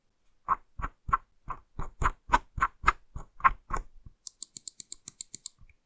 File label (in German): Maus Scrollen und 5 mal Mausrad button